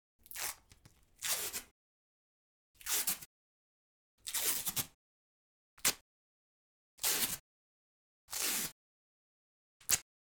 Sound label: Tearing Tape